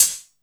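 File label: open hi-hat, drum & bass; Ueberschall; Drum & Bass Resonance; 150 BPM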